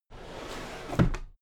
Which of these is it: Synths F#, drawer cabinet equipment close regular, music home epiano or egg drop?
drawer cabinet equipment close regular